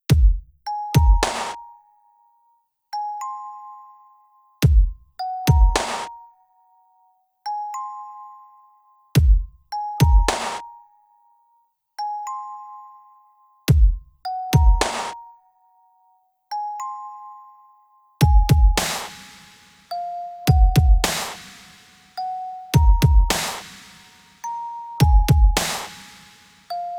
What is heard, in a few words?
Disturbing; BGM; Altdeus Beyond Chronos